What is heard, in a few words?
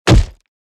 SMACK